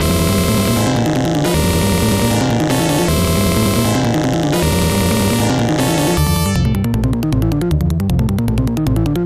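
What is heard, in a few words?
background music; Side Arms Hyper Dyne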